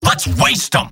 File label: scout battle cry; vo; tf2; mvm